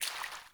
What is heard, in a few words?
Fawn Footstep Water, Foley; Animals; Ambient